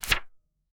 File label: paper